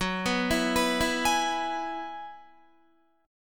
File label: F sharp 9th Suspended 4th F sharp Guitar; F#9sus4 Chord; arpeggiated